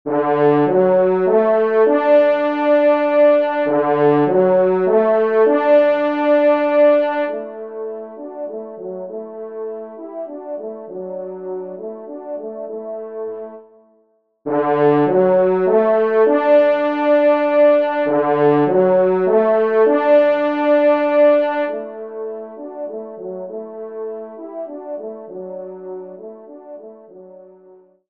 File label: Te Deum Laudamus; Vacek